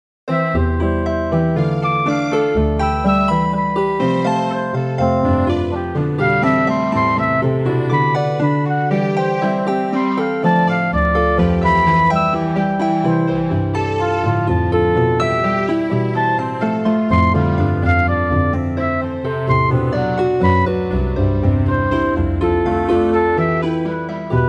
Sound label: triadic dance in three time, fractal music Chord Progressions